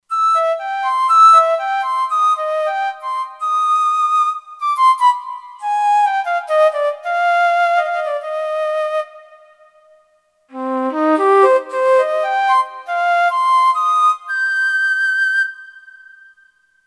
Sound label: Treble Flute, Kurzweil K2500X; Factory Programs